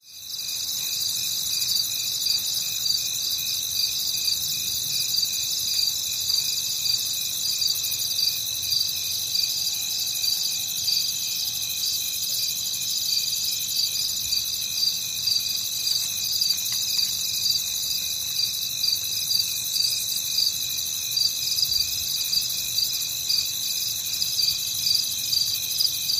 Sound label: Crickets Night Ambience